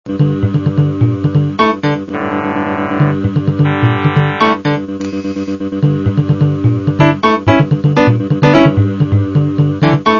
intro mus

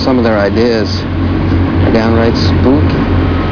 spooky

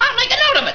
make note

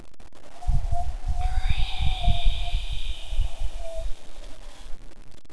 tree

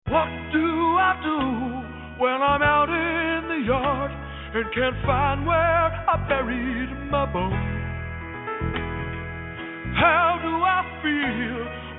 yelp

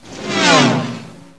speeder bike

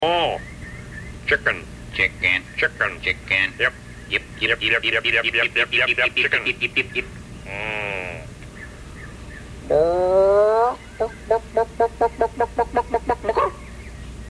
yip yip

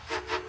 toot toot

